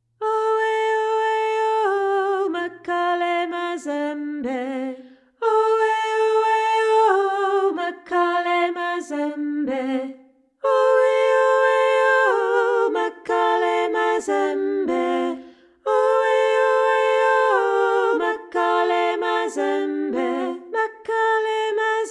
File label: unison high